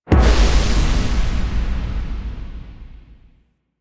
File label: Void Dragon Floor Lava Pillar Explosion End; Enemies; Warframe; Duviri